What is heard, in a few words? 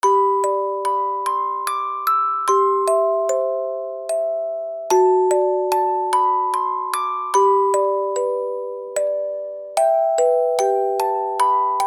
Lullaby MusicBox